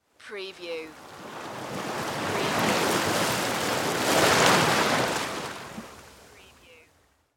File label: Ocean Waves Crash